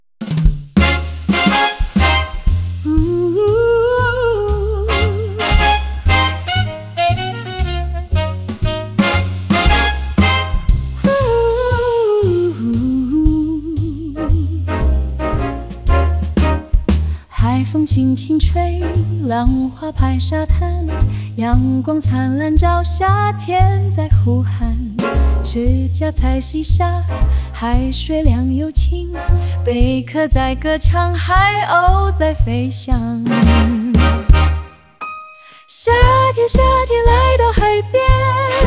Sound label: summer jazz